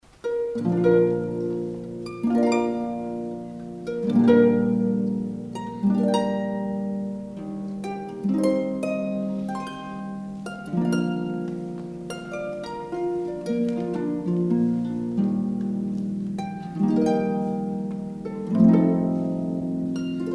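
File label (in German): Flöte Harfe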